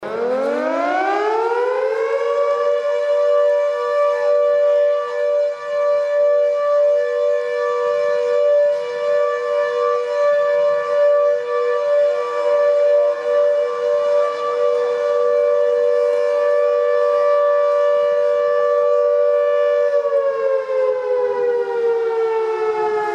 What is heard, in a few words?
fire siren